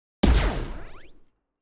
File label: Big Blast, Sci-Fi Blaster; Async Audio